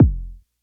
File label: kick lofi